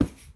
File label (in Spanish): Perrito salta sobre sillon